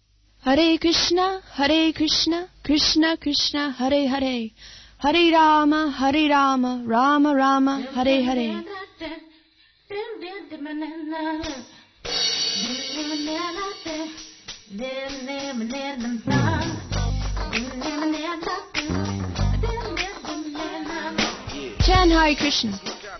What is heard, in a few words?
Jingles Chant Hare Krishna; RKC Live Stream